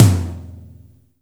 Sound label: TOM D, Drum Dry; East Collexion; Drum 1